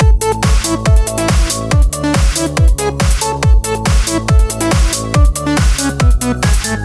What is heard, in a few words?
Win Music